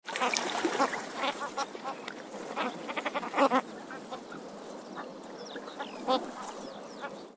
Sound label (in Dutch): Kemphaan Philomachus pugnax